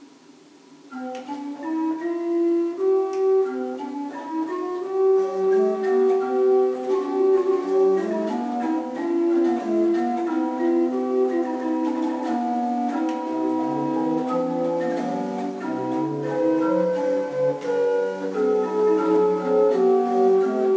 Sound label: Seifert, pipe organ